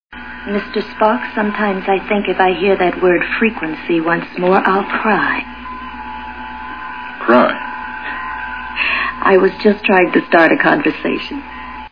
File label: cry